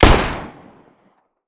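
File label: glock, gun; arccw go; glock18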